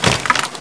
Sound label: ladder